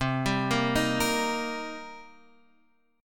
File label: C Minor 7th, Guitar, Half Step Down